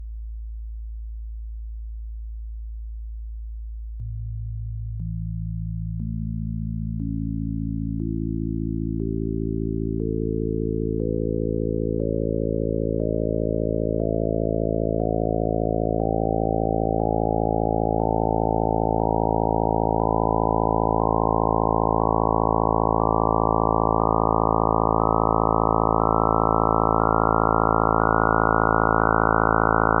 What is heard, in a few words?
Harmonics